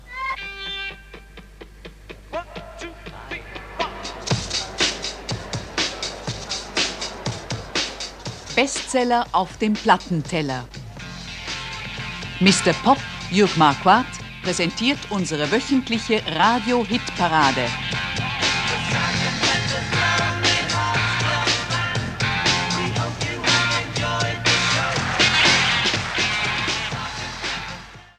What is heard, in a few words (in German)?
Hitparade Signet